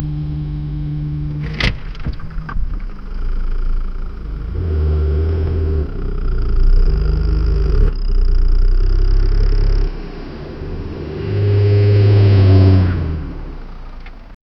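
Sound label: a cat roars and throw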